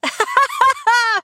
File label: laugh